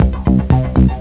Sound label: bar snd; Swoop 1.0.0; Swoop Music